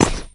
land; princess; Brawl Stars

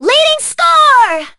lead; Brawl Stars

